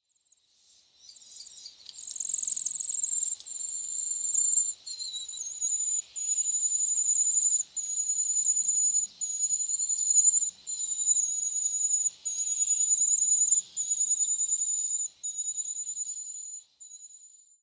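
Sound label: Common Cuckoo, Chikuma; kakko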